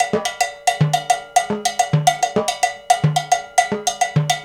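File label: 108 bpm, GuaGuanco; Spectrasonics - Supreme Beats - World Dance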